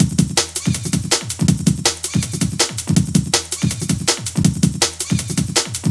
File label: 162, breaks, Loops; m8 breaks